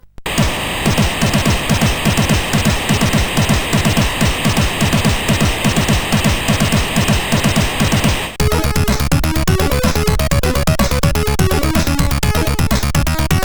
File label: Wemen Intro; Signor